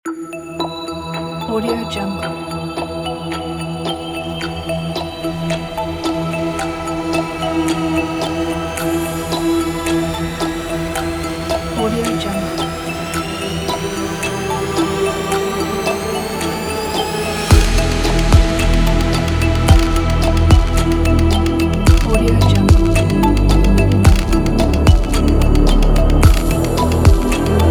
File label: dark ambient kit; studiokolomna